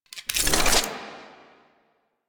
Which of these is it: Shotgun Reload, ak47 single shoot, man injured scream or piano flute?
Shotgun Reload